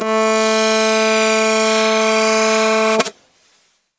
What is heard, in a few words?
guitar reed